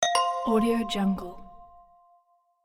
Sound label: positive game win; dulebas